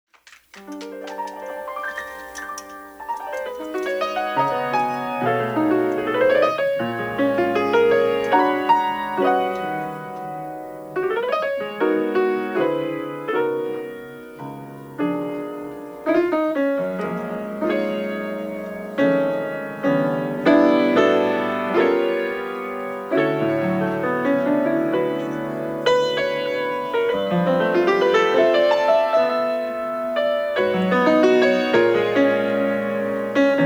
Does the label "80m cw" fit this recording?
No